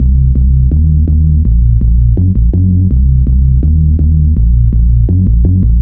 bass loop 165bpm C2, Drum&Bass; Total Drum&Bass

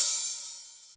H, Hi-Hat, Yamaha DTXpress

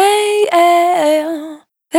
Dry, Single Voice Melodic Loops; Delos; Singles

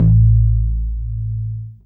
sub A, techno trance bass

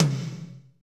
TOM, Drumscapes Roland; Northstar - Drumscapes Roland

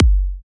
kick machine

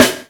GATE SN, Snare

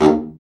BRA BARI, Bariton Sax

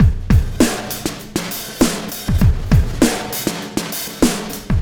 Sky Lab Beat, Processed Drumkits

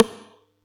Yamaha EX-5

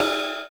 ride, trash kit; USB Soundscan vol.11; Drums Dance & Groove; AKAI

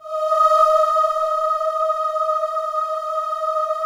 Matrix 12 choir, vintage keyboards; Big Fish Audio - First Call Vintage Keyboards

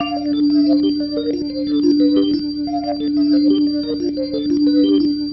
Pattern, Organ

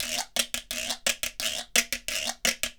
GUIRO; ILIO; Synclavier Percussion 2; World & Orchestral

